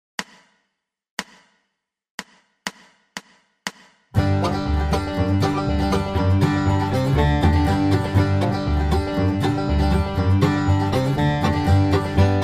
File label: Roanoke 120bpm, banjo